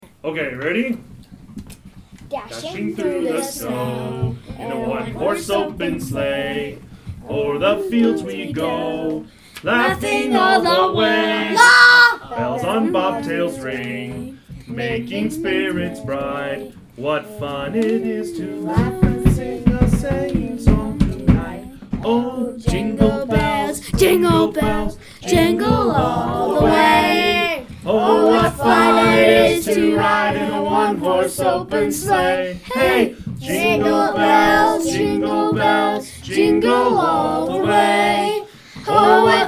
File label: Jingle Bells